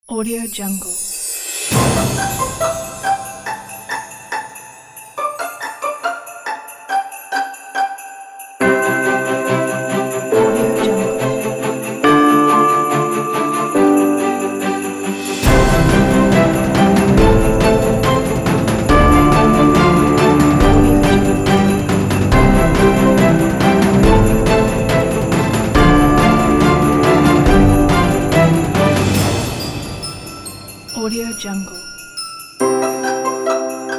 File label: christmas kit